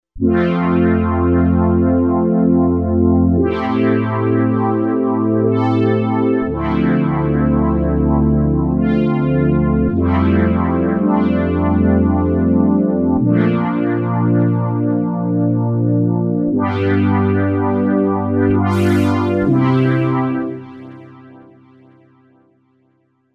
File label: Brass Pad, Roland V-Synth; Factory Patches